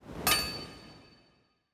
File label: ambience room forge pickaxe ready